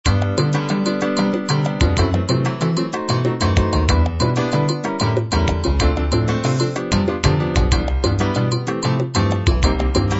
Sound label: afro latin 3-4 jazz